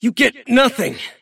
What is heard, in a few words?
deny pain; davion; dota